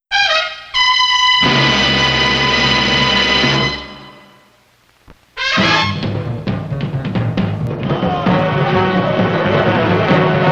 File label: End Theme With Sound Effects